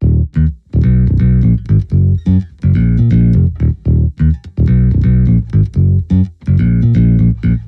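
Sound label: 125, bass loop guitar electric, D minor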